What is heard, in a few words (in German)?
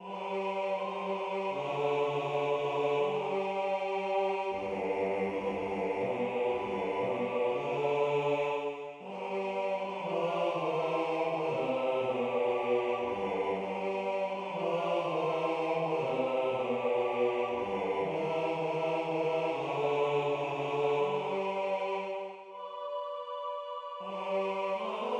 Lueget vo Baerg und Tal Bass